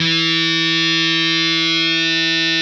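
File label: fuzz, fuzz guitar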